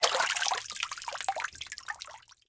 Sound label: Liquid Water Water Dripping